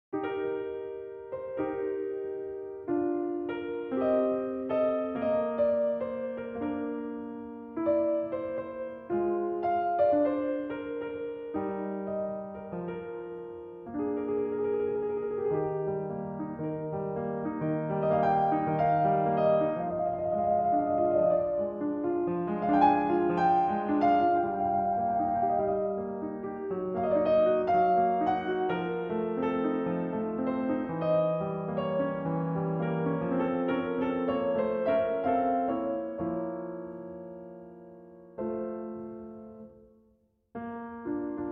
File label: Piano Sonata No. 4 in E-Flat Major, Adagio